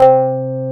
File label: Korg CX3, C 1